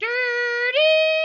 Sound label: french ambulance, siren; gmod; svmod